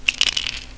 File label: Shake Meds